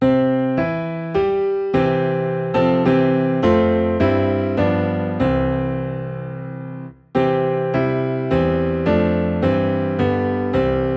Piano Low in C, hymns; Christ for the World We Sing

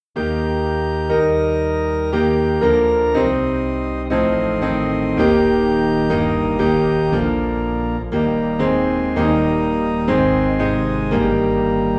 Piano and Organ Low in E Flat; How Firm a Foundation

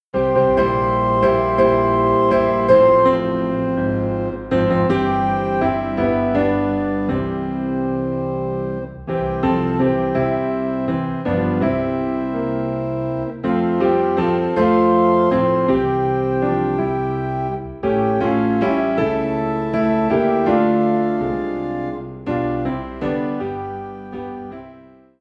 Piano and Organ - High in C; His Eye Is On the Sparrow

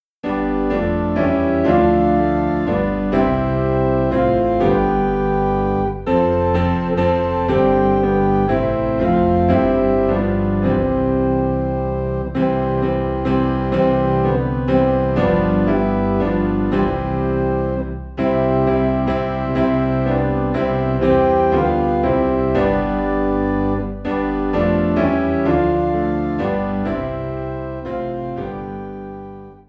Piano and Organ Low in C; Sun of my Soul

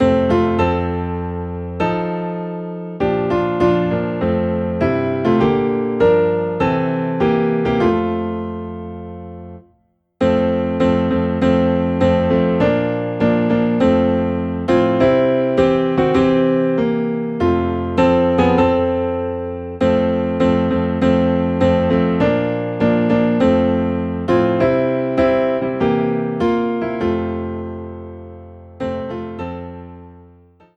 Piano Low in F; Power in the Blood

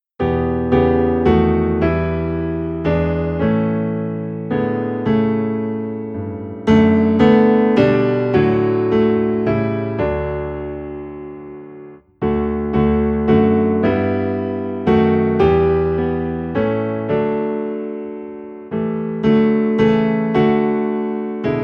Piano Low in D

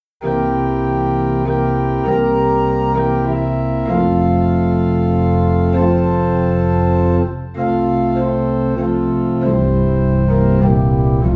Silent Night - Organ Low in F; christmas

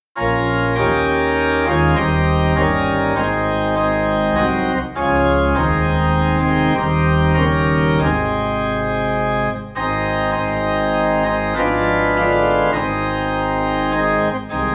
Organ Low in A Flat

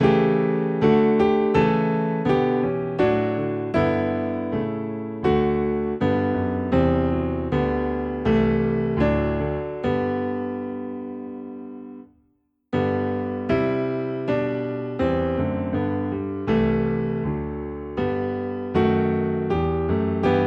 Piano Low in G; Oh Sacred Head Now Wounded

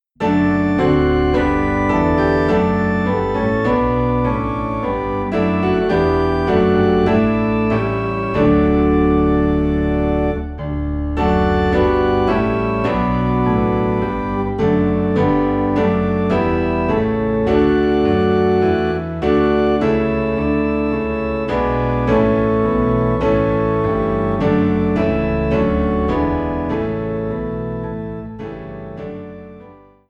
Piano and Organ Low in D

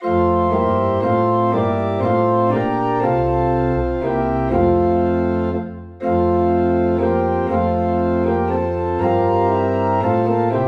Organ in F; Come Thou Long Expected Jesus

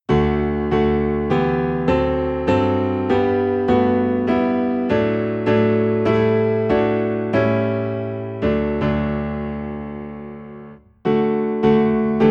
Piano Low in D; Joyful Joyful We Adore Thee

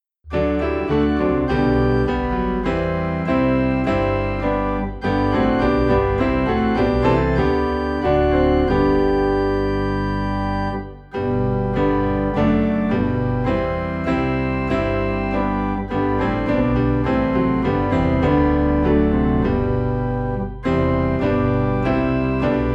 piano and organ low in G; Christ the Lord Is Risen Today

